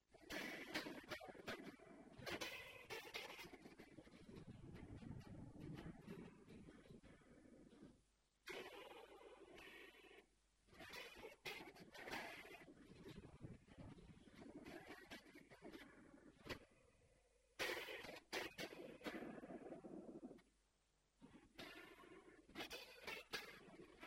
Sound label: JMI Tele